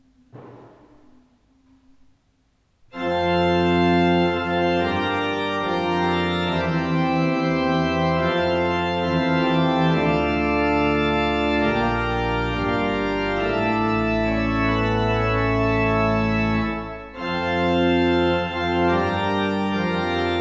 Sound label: Fanfare; tangen